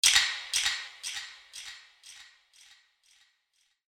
wood

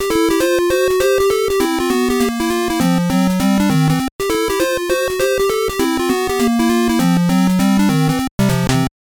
Game Win; Anticipation; NES

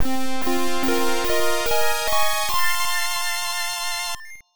Jingle (Level Up); rpg tsukuru dante98 ii pc-98 1996

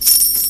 Nano kristal

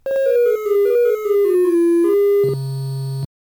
Round Clear Music; Phozon; arcade

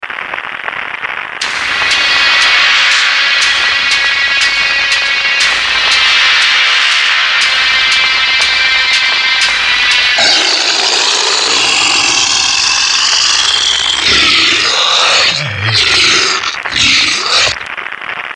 gramophone; Necrovision; Easteregg

